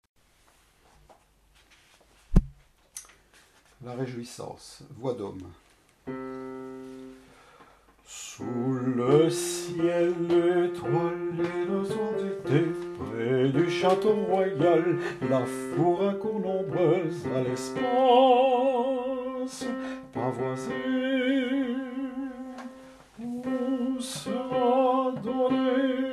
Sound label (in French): basses tenors; la rejouissance